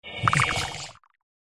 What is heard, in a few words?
Water Spirit Block